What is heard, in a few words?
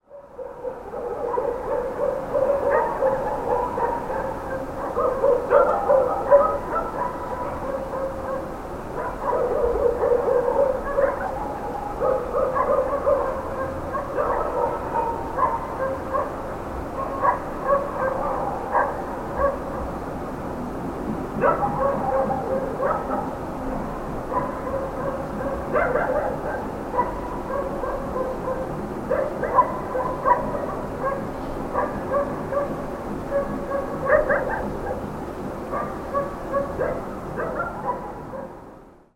Dogs Barking; add everything stir